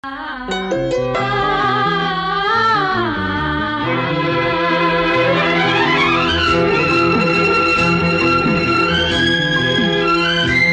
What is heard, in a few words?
mesmerising bgm